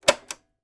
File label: household hi fi system stop button press